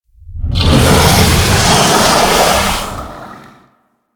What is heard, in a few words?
Forest Water Pump Retract; Ambience; Props; Warframe; Grineer Forest